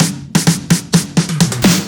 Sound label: Fills 128BPM, Clubsounds